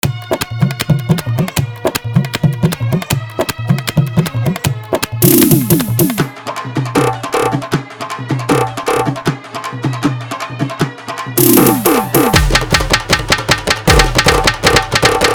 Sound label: Bolbam Bhajan Full Dhollki Bass Mix